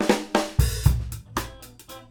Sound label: FILL